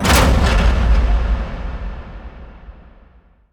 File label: Door Large Metal Stop; Doors